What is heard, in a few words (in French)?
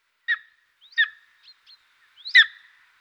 aigle royal; environnement